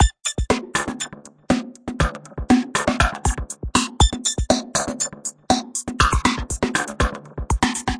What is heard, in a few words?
flangey melodic beat, 120 bpm